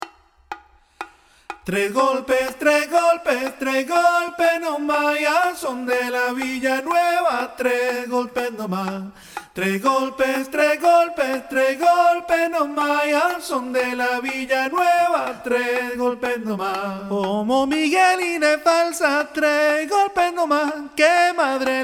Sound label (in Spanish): tres golpes alto